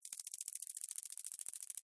Millipede Walk, Animal, Millipede, Walk, Centipede, Movement, Insect, Bug